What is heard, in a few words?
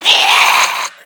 Moon God Attack; unsighted; enemies